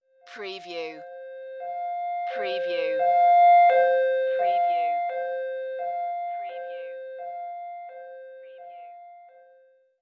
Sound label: Police Car Siren, Pass By Two Tone